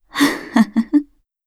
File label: comboburst